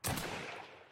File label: Medium Weapon Shot